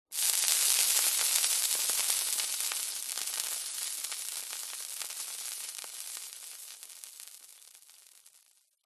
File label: food cooking oil mixed with water hot pan sizzle pop; zapsplat